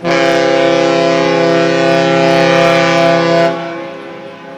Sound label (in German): Schiffshorn